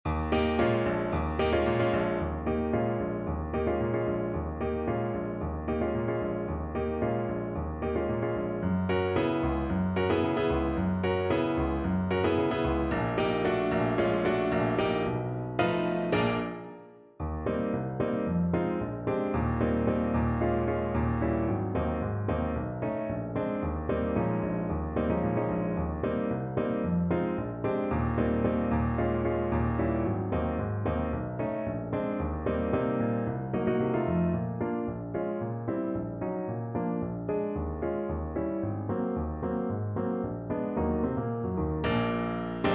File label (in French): Accompagnement Piano